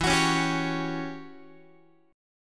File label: E Minor 6th Add 9th guitar chord; Em6add9 strummed; JGuitar